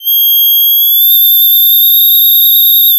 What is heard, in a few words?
pop, sound of a tea kettle whistling